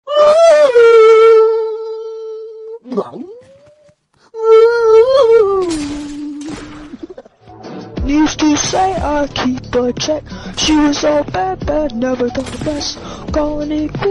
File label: swinging